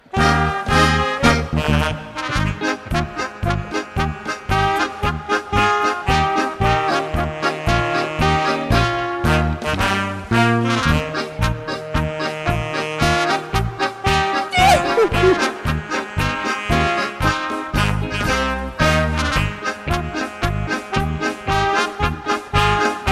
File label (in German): Vogelwiese Polka, brass band